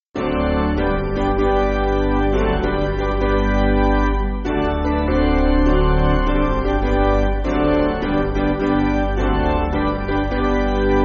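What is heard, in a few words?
Piano, Backing Files for Churches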